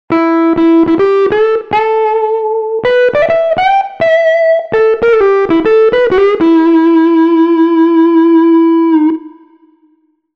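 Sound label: Blues Guitar; TURBO VL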